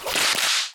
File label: whip attack